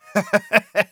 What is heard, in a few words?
laugh evil; Medic